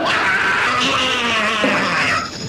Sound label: scream long